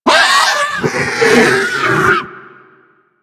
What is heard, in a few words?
Snow Critter Vocal Death; Warframe; Hunting Critters